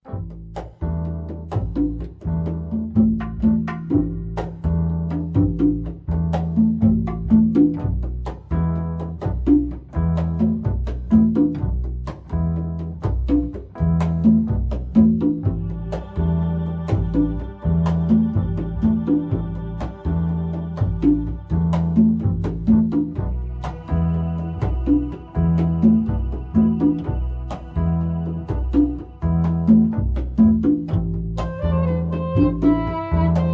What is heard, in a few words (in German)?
Wiegenlied